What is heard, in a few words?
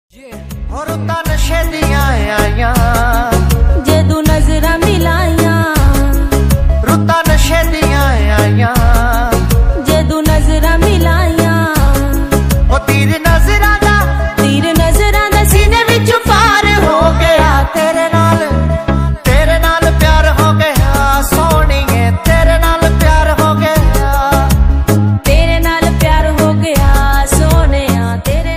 panjabi ringtone